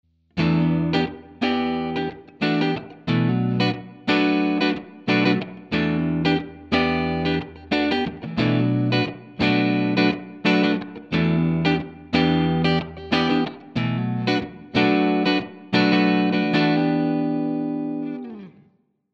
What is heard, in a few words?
ESP SC 20 Neck PU clean